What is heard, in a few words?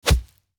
Golf swing no ball contact